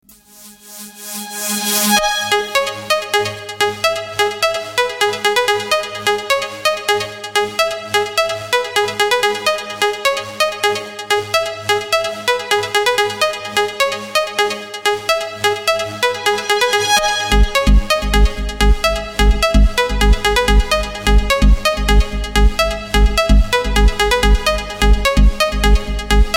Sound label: trance; electronic